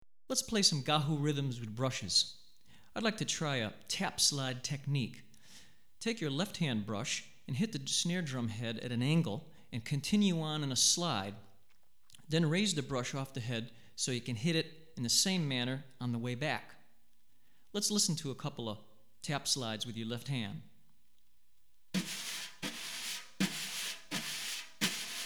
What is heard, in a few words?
Brush, West African Rhythms For Drumset; Gahu; Hartigan